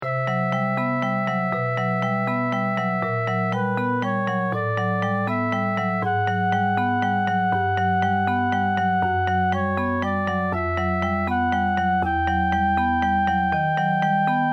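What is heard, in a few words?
night scene flute & guitar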